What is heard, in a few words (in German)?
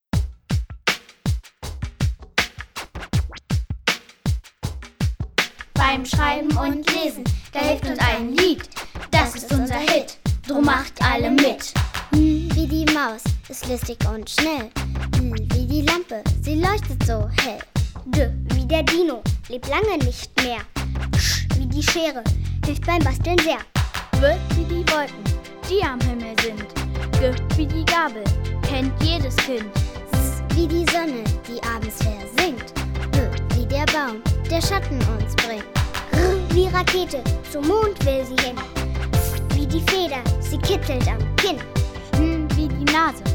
Schreiblern-Rap